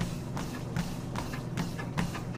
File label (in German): fitness laufband loop; minecraft